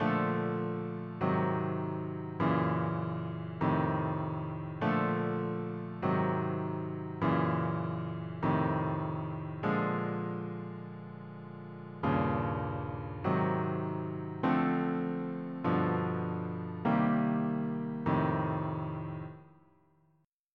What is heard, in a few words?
rhythm changes walk down